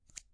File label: Scissors Shears